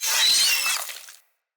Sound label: Root Spider Vocal Death; Animals; Spiders; Vocals